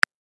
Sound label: next turn